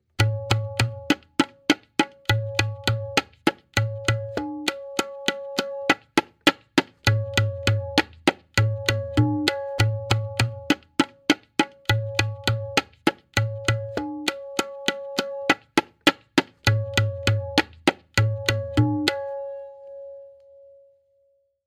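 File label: Variation 5-Played, Benares Kaida 1, Tintal; tabla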